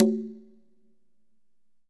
Congas Wet; Drums Percussion

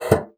BASSOON; Xsample VOL-6

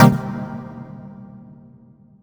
Stab C2, Club Techno; Zero-G; Stabs

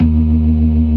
ORGAN, NO PERC

